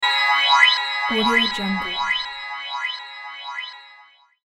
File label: chime text tone with reverse delay